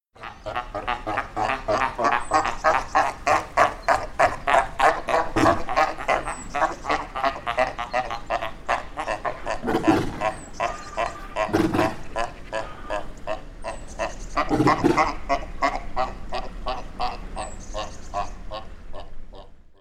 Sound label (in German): Seehund Phoca vitulina; tierstimmen